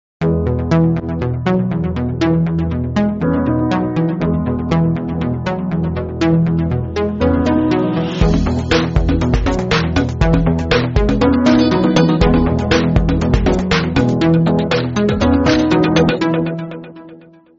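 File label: Nokia, ringtones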